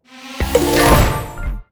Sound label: pick n click; netent